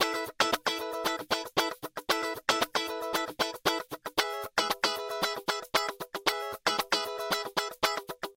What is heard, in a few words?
main Guitar Top; twin spin mw